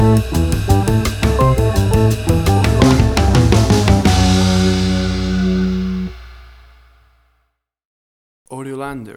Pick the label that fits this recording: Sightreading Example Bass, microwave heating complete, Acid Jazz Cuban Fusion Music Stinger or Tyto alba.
Acid Jazz Cuban Fusion Music Stinger